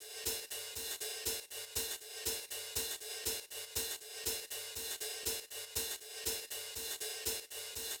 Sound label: Hh Rd Loop 120; Hh-Shake Loops 120; Equipped Music; Loungin House